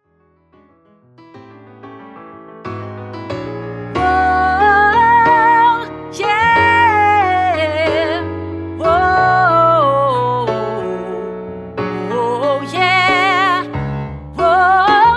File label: wo ye wo, women